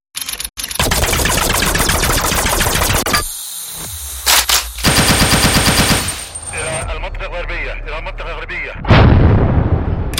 game weapons gun firearm sound fx; epic stock media

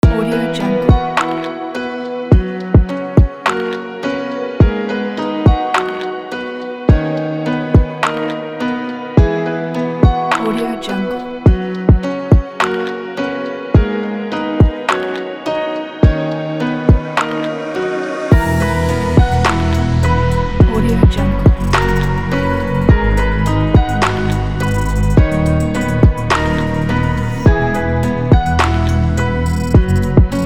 warm night music

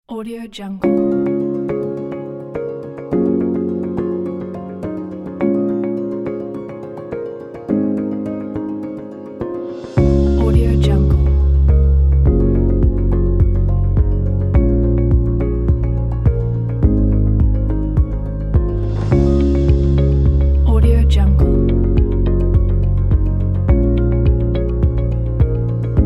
motivational fresh ambient music

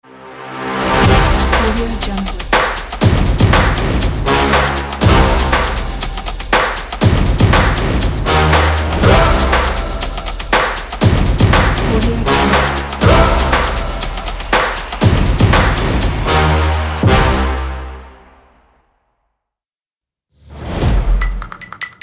drums stomps logo pack music

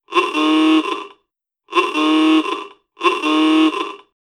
car horns sound fx; sound ideas

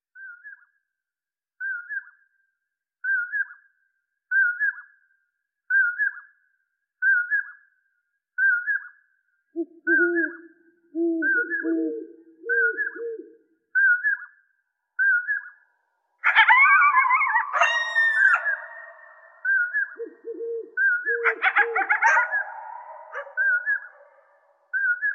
canyons surround edition sound fx; boom library

